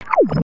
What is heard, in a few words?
Bubble